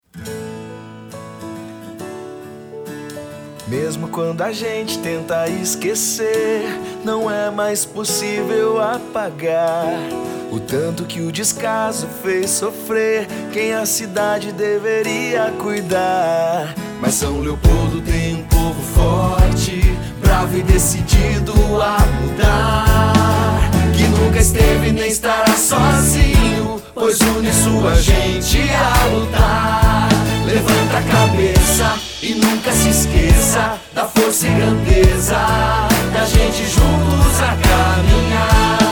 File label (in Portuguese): Jingle